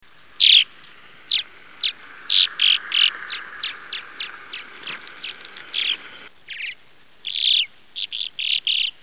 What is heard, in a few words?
The sounds of birds